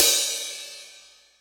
crash cymbal single hit b key, Royality free crash cymbal tuned to the B note; cymbal drum samples